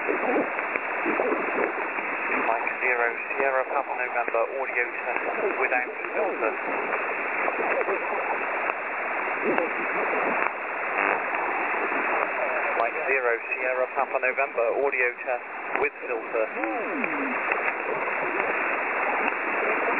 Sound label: FT817 Filter Test; M0SPN